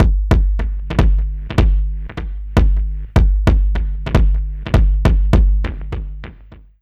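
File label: NAKD bass drum, drum loop; Big Fish Audio; Uncivilised G'rooves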